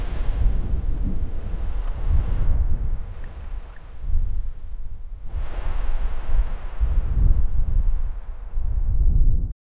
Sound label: beach atmo light waves; beach atmo light waves se